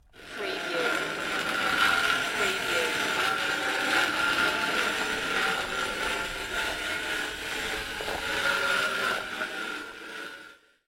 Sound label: Metal Slide - Rock Grinding Against Smooth Metal